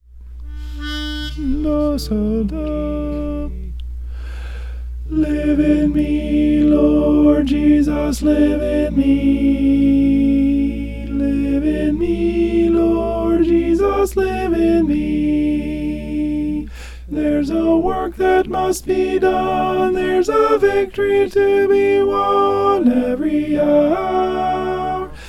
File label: alto